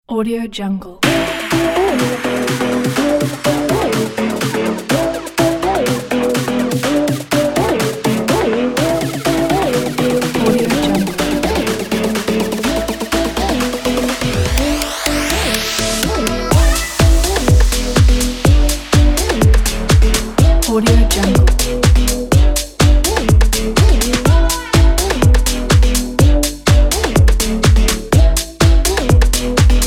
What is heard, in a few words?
stay house